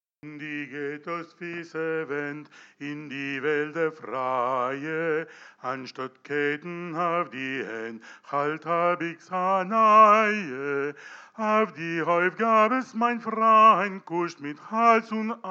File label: yid du partizaner alto chant